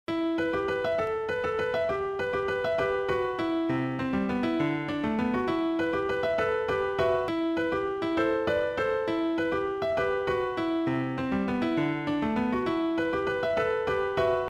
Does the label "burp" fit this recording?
No